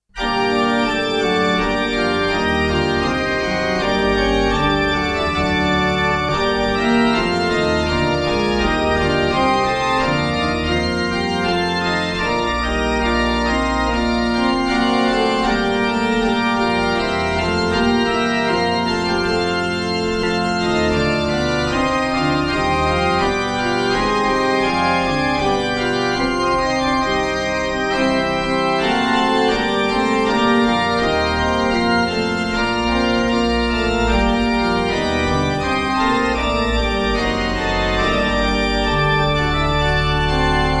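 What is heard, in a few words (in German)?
Godefroy Hartmann 17 II P, pfeifenorgeln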